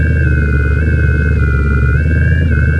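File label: ambience generator; cruisecontrol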